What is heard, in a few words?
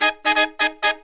Pop & Funk Brass